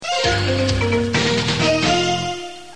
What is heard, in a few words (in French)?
jingle pub